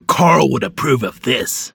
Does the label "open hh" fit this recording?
No